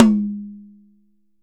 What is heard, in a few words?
YAM 8X8, Drums; Big Fish Audio; Ross Garfield Drums VOL-2